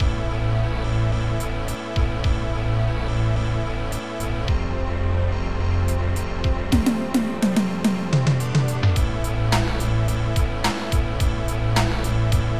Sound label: strings